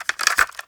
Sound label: Cassette Rattle